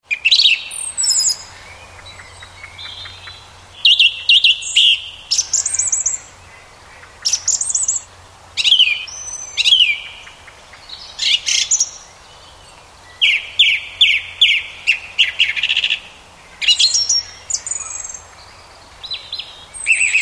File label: Song Thrush, Song Thrush singing at dusk with other bird songs in the background; Animals